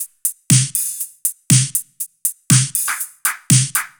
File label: New Wave 120 bpm, Drums; Loops; Top; Manic; Ableton Live 12 Lite; Core Library